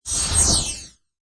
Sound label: Magical Shimmer Burst Bright, Magic sound of casting spell